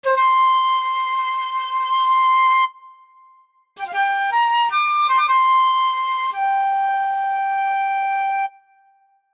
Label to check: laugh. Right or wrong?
Wrong